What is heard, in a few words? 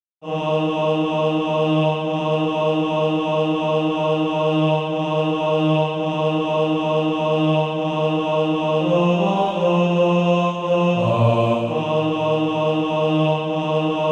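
bass, SATB Practise Music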